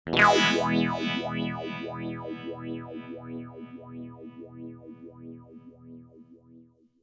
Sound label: strum full depth full regen rate at noon 4 stages